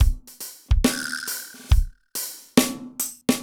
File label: V Drum Loop; Sonic Foundry (Sony Creative Software); Electronic Point Blank; Drums & Percussion